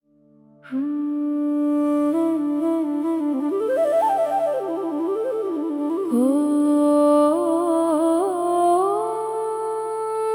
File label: hanuman mantra om hanumate namha female; ringtone; hanuman chalisa